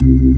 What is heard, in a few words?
ORG, Organs